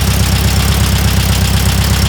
Truck; Vehicles

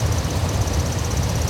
driving IDLE; FRANK; Cars; XBOX

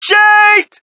crowd, shout; Mike Tyson Heavyweight Boxing

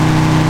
GTO rpm, Engine; Cars; XBOX

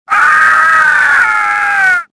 death, worker; worker2

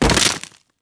deadfall stone, foley

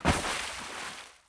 splash small Object; water splashes

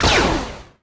rifle fire; weapons; trando

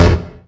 error

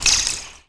land wire, terrains; Tony Hawk's Project 8; XBOX

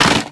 Wood Crash; breakable

